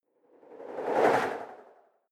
whoosh simple flutter phase

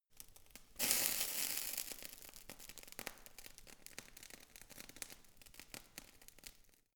fire torch sizzle

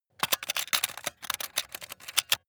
metal handling mechanical click spring long

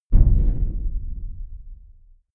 big metal impact low dull

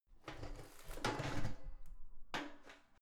garbage can open lid soft

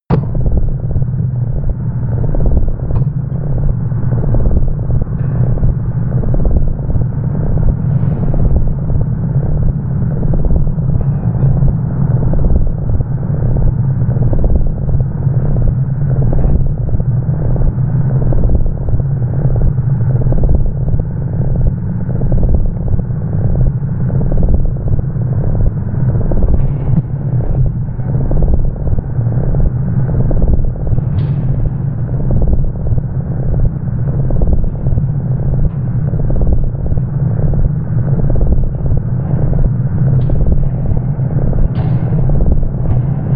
atmosphere industrial hypnotic low

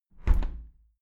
window plastic squeak close regular

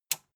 metal button tiny release enable

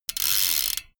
bmx bike crank freewheel chopped long

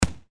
boxing single punch

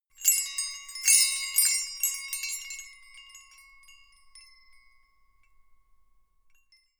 windbells ringing

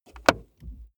plastic plate crevice click

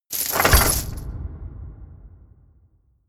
medieval menu heavy open

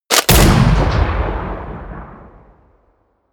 shot

